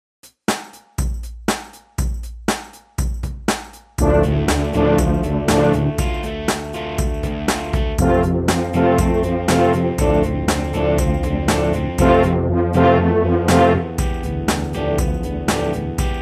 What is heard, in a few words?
Rocking Horn